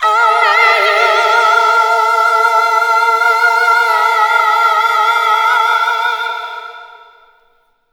ONLY YOU, Funky Vocals, SHOUTS; USB Soundscan vol.18

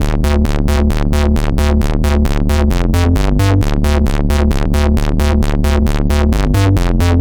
C64 Bass, Commodore 64, Chiptune

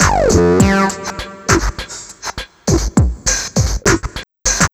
Jilt, Synchro Funk, Beats; Sonic Foundry (Sony Creative Software)

